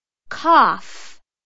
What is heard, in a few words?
cough